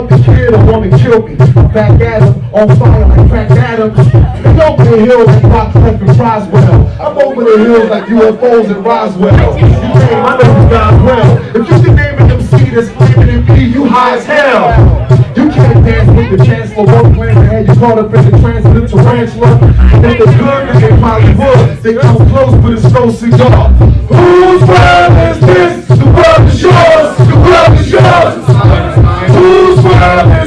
jazz cafe hip hop

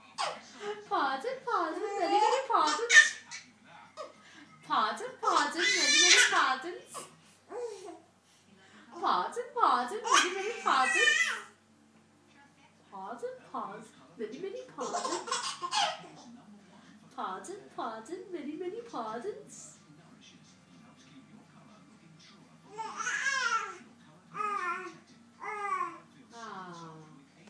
laughing

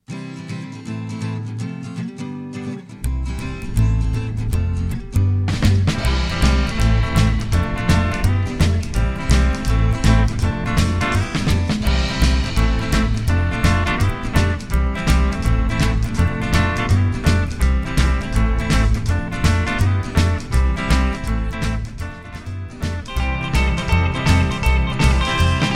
backing track sample

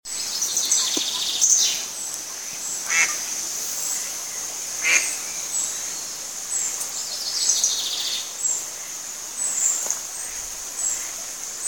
the sounds of saltburn

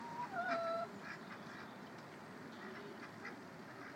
hens and ducks

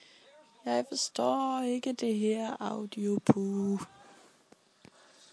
confused

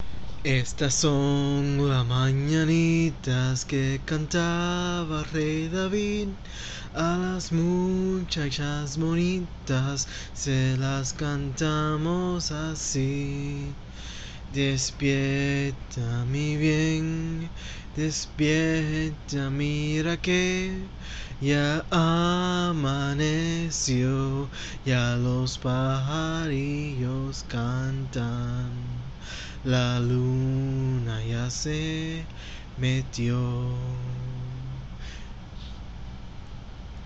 mehhh

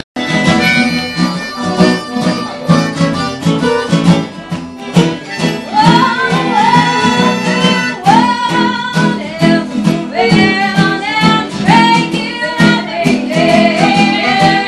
acoustic session coffee shop; strathpeffer

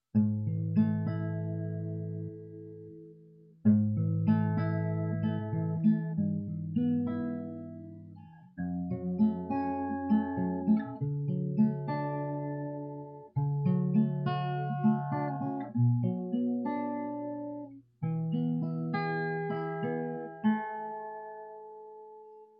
guitar begin

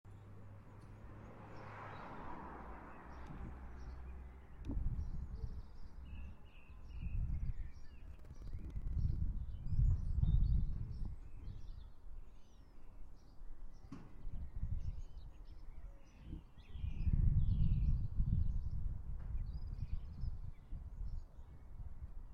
sunday afternoon birdsong

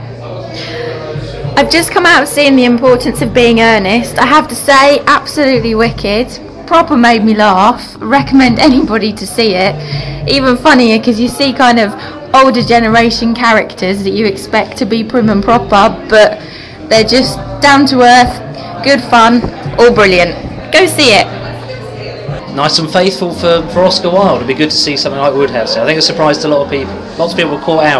audience feedback

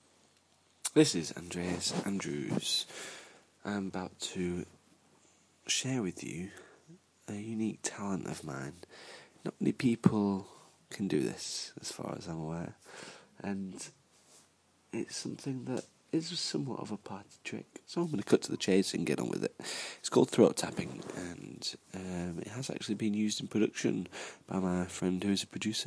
throat tapping

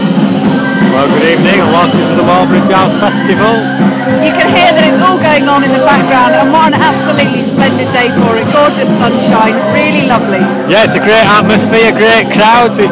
jazz

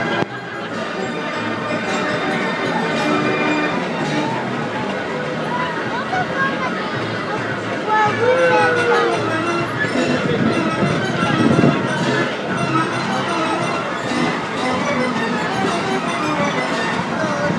fairground sounds

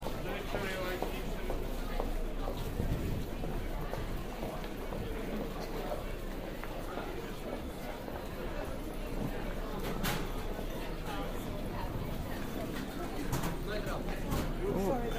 binaural underground commute soundscape; from london victoria to oxford circus

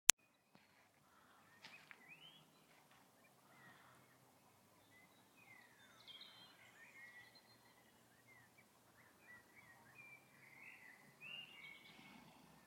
cuckoo; little bracebridge

